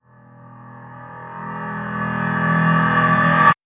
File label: Piano Attack Short Reverse